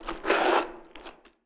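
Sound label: Zombie Footsteps; Blind Survivor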